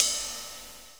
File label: Ride; Equipped Music; Loungin House